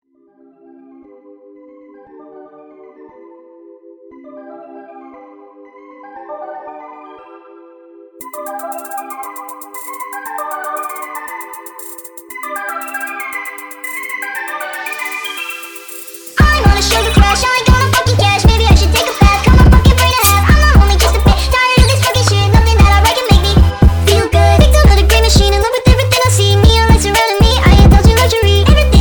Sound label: sped up